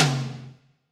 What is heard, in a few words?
tom, drums; Yamaha SY85